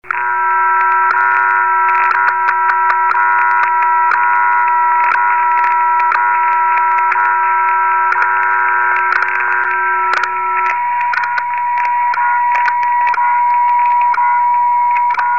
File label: 10 MHz WWV